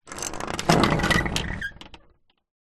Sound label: household gate wooden rolling roll short